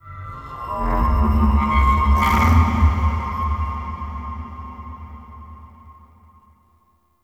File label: Tech Texture Large, Ambience; Warframe; Cephalon Weave